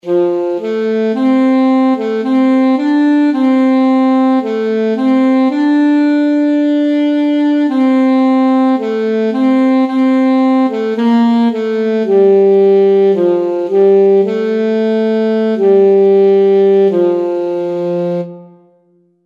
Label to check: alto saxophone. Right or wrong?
Right